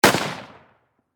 Warfare Rifle .30 Caliber M1 Garand Semi Single Shot Distant Perspective; Rifle Sound Effects; SFX Bible 6